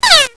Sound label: rifle; HALFE LIFE 3